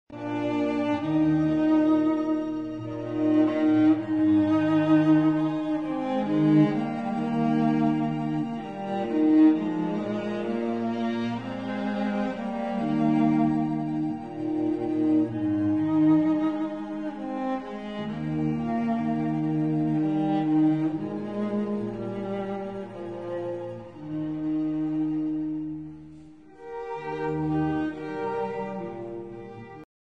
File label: lullaby, string quartet; brahms